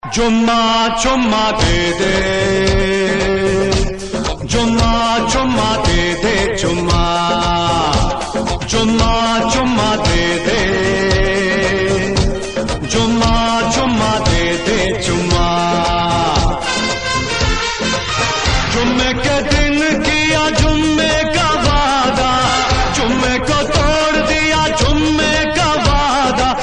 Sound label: original hum intro, ringtone